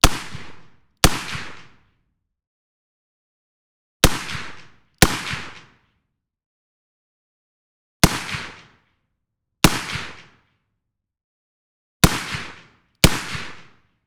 Smith & Wesson M29 .44 Magnum 1.5m behind right clean Double shots